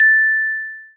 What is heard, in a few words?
Send Text Done; CoolTerm